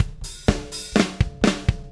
Drums, Rock

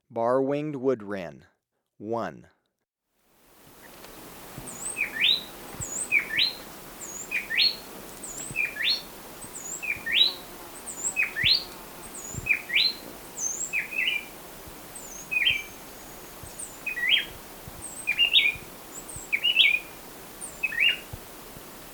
Bar-Winged Wood-Wren; S. America

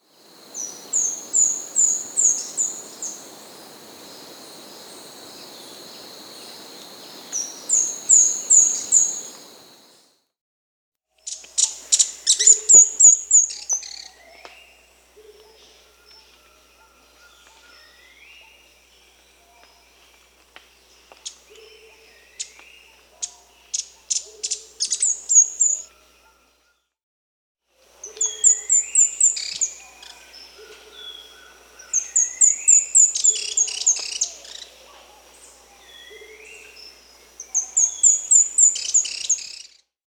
Speckled Spinetail (Cranioleuca gutturata), Peru; S. America

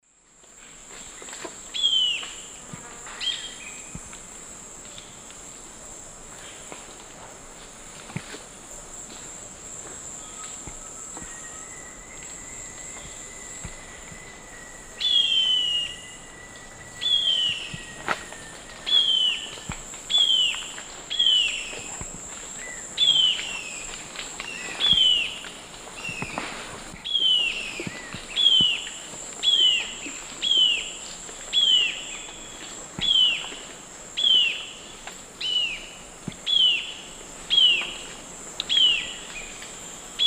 Galbalcyrhynchus leucotis, calls; S. America; Eastern Lowlands

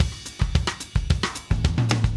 Drums, Jazz

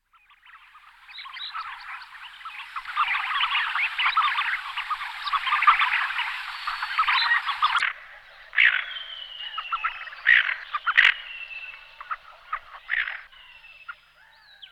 Aythya fuligula; All the bird songs of Britain and Europe; Jean-Claude Roche 1990

